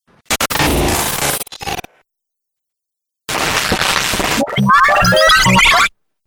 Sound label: Dubstep Scifi Effects